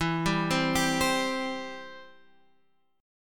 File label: E Minor Sharp 5th E down Guitar Standard; Em#5 arpeggiated; JGuitar